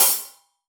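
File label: Half Hat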